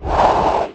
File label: crawler attack; Barotraumatic; Cthulhu; InsanityMonsters; Hallucination1